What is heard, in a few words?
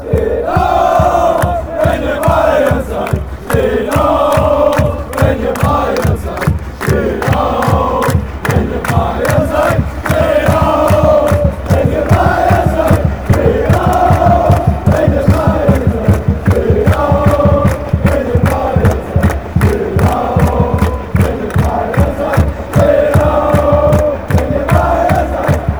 chants; stand up